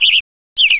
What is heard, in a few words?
western tanager